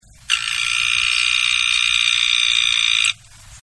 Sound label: Dendrobates Auratus